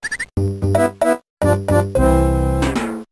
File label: Player Down; SMA4